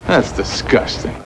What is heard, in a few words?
disgust, fart noise